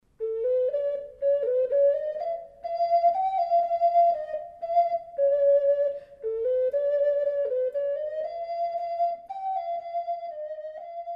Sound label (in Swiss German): Mir Sänne, ocarina